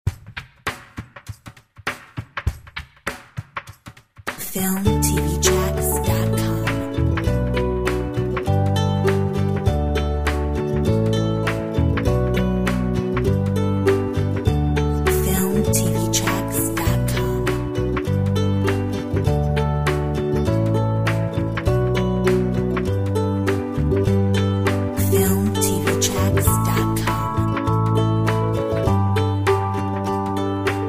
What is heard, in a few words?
My Ukulele